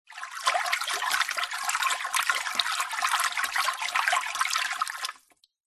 nature water surface agitate swirl circles finger gentle plastic tub